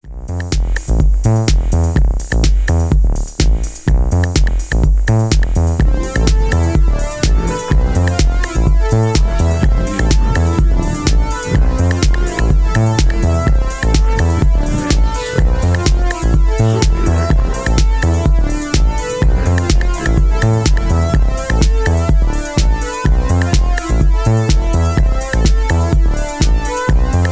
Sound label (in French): orgue electronique; house